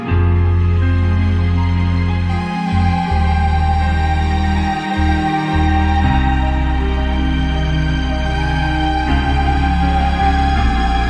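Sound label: Huawei P20 ringtone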